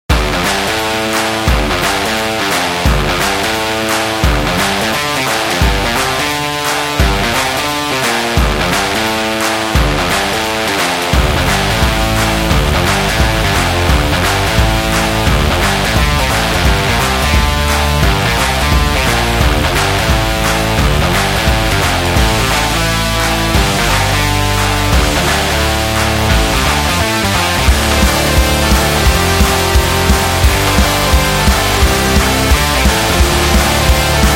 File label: Happy Energetic Rock